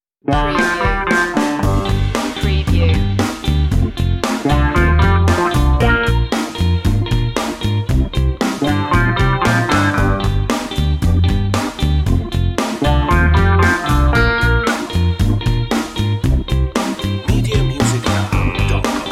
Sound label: playful wah wah